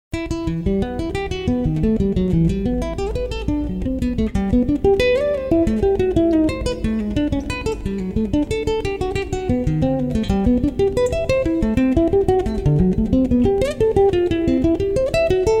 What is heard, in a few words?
Partita in B Minor